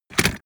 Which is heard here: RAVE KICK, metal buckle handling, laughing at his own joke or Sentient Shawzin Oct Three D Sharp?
metal buckle handling